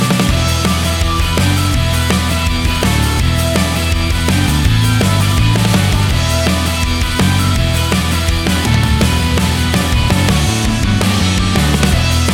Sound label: chorus bit